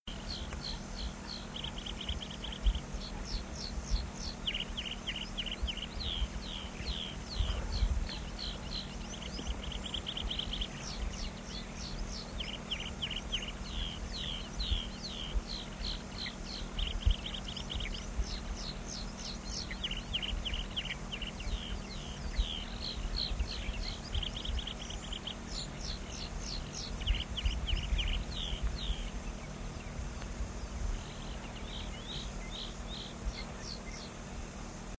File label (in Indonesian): suara apung papua